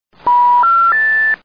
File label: disconnect, Phones